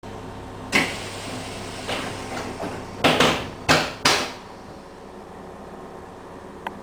klaxon, Train; RBDe560

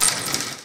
Pan Contact, Train; OpenBVE; Cl313 Silverlink

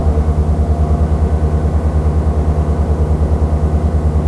Motor, Train; GP38

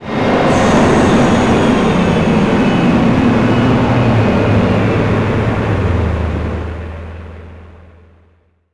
rundown, Train; OpenBVE; 55022 Royal Scots Grey

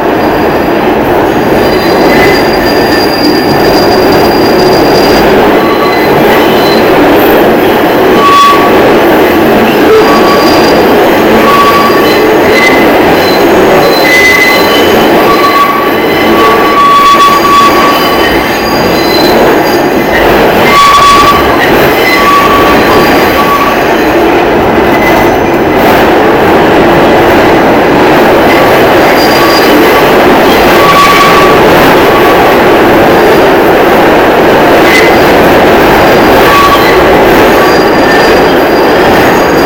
Flange, Train; R220 MUE5 - 6 Car (Alstom) 2019-Present

